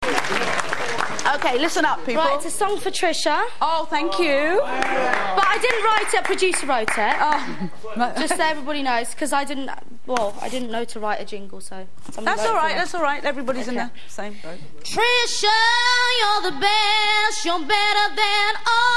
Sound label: singing; Big Brother 6; Trisha Show